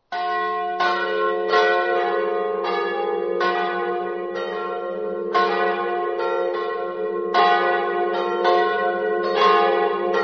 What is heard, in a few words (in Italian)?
santandrat, campane